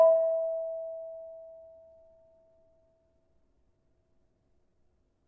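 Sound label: Barung E5, gravity harps tuned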